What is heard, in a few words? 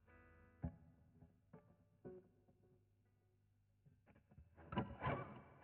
GTR B Solo